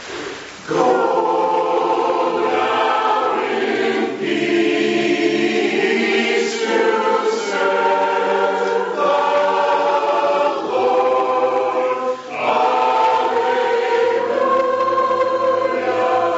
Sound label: Choral